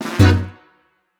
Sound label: near Win; netent